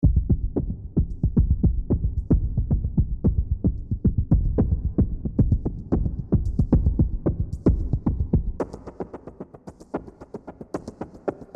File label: Piano Beat WET